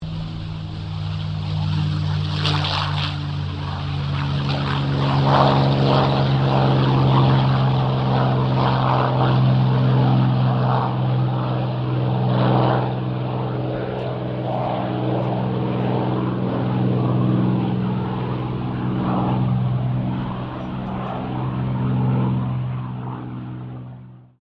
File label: AIRCRAFT CA-18 MUSTANG TAKEOFF; P51 /CAC-18 Mustang; Airplane Sound Effects; Airplanes (Sound Effects:Vehicles)